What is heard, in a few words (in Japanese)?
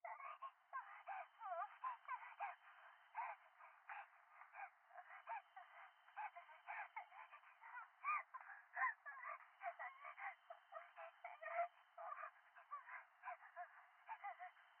可愛い喘ぎ 山奥